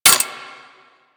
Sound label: Low Clip Mech C; Warframe; Zylok Prime